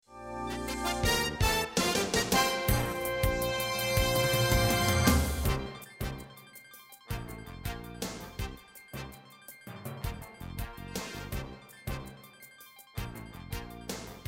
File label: NEWS OPEN STINGER FADEOUT